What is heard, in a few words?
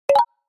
Message Received